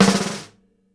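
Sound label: SNARE ROLL, Live Snares; PlatinumPhattCD1; E-MU Producer Series Vol. 8 – Platinum Phatt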